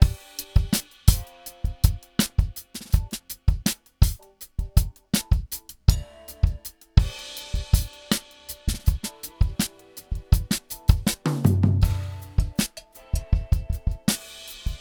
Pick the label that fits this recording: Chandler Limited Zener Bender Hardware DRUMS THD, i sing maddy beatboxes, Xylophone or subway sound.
Chandler Limited Zener Bender Hardware DRUMS THD